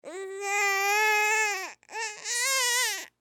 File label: Human Baby Fuss Cry; Babies (Sound Effects:Voice)